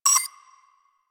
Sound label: Bridge Comp Beep; Warframe; Liset; Hub